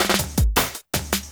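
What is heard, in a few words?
loop snare drum, drum & bass, 160 BPM; Ueberschall; Drum & Bass Resonance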